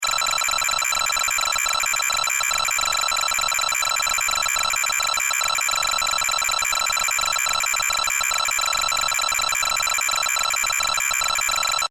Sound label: Science Fiction Computer Process Beeps; Computers; SFX Bible 6